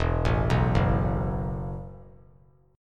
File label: E Suspended 2nd Suspended 4th E, down; arpeggiated; JGuitar